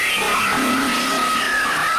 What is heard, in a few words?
jumpscare sound; five nights at gipsy's